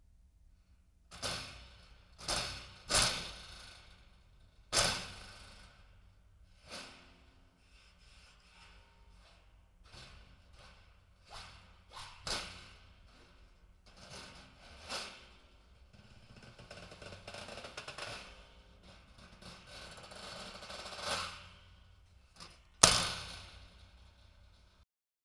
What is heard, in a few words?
Spring Plank